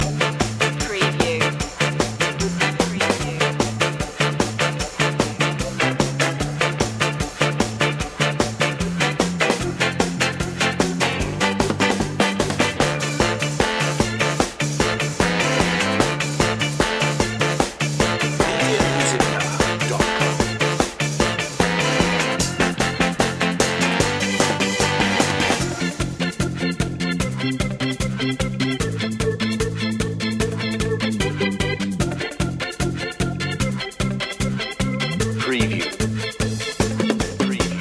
ska

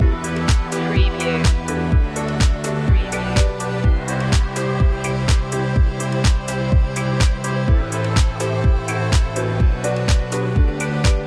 dreamy electro vibe loop

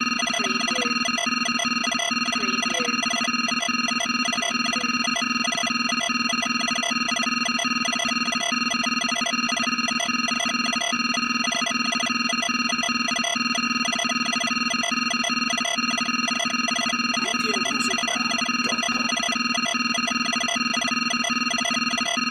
Computer Process Beep

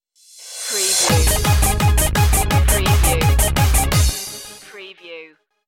bubble gum beats stinger

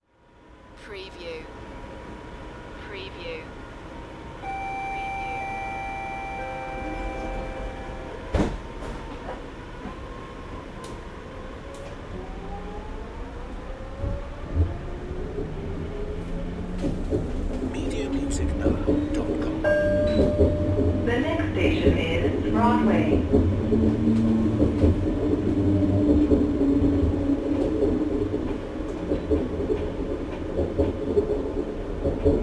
Subway Detroit People Mover Riding Inside

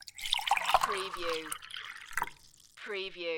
Pour coffee into a coffee mug

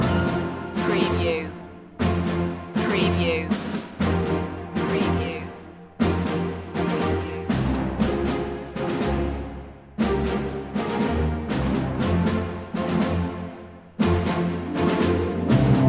the long march home loop

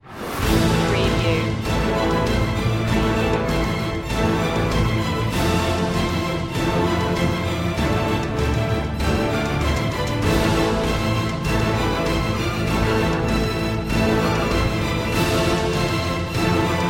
no choir

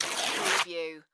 Cloth Rip Fabric Tear

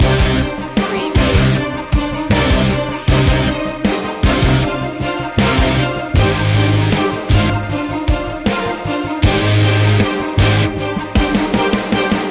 drama heavy loop

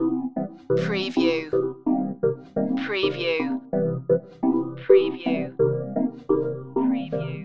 wheels of steel loop

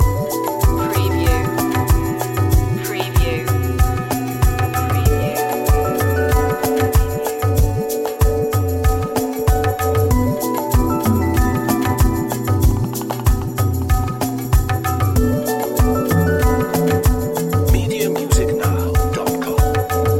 asian steppe loop

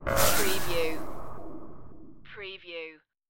tech glitch sparkle

